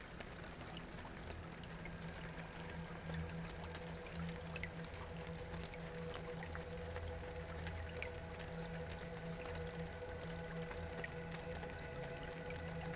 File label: White Noise For Baby Sleep Deep Cavern Meditation